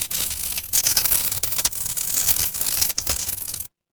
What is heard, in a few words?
magic scifi portal